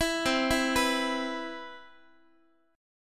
C Major 7th, Guitar; CM7 arpeggiated

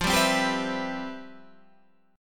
C Major 7th, F, guitar chord; Cmaj7/F; strummed

